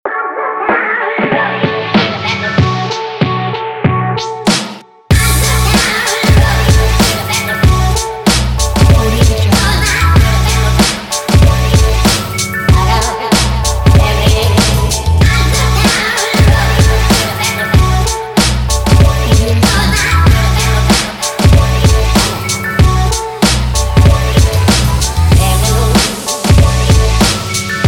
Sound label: hiphop